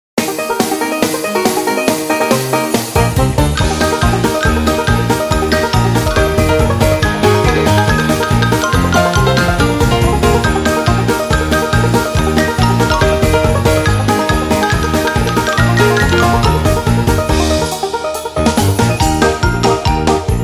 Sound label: Funny Christmas